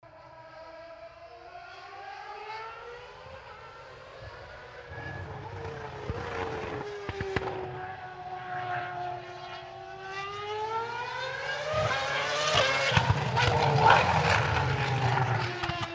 Mercedes W02 F1 Car Braking and Accelerating Sound; Mercedes Petronas W02 F1 Car